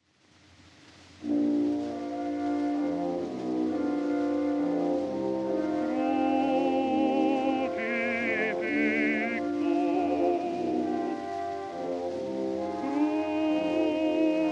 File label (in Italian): Canto religioso; CRUCIFIXUS